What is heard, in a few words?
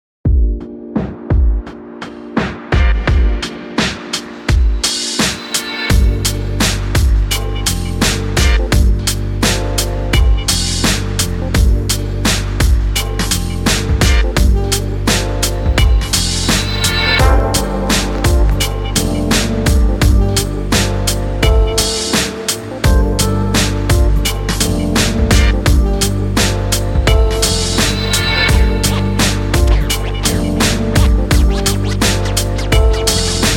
Hip Hop Summer, relaxing lo-fi